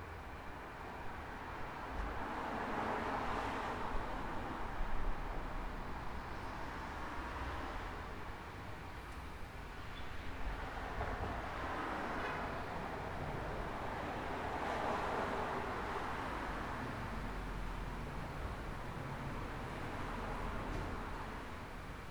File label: urban night loop; cstrike